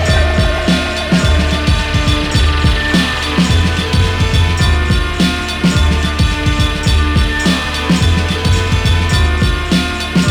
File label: saw boss loop; svencoop; uspninjas